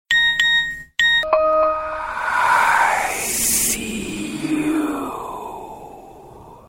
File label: scary sms; ringtones